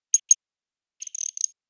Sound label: bark chatter audible